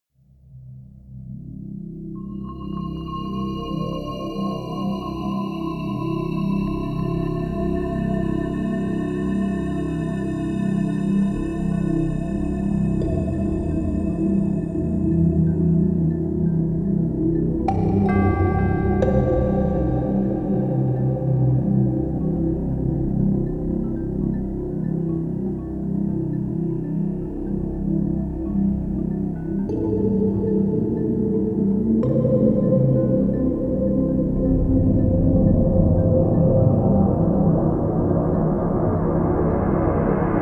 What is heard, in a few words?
drone slightly tinkling